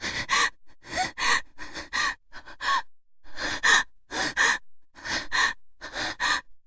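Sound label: woman frightened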